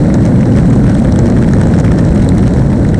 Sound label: roll asphalt, terrains; Tony Hawk's Project 8; XBOX